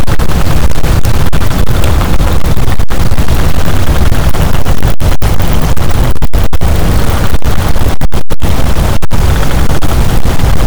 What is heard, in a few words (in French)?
cachalot, boat; Physeter Macrocephalus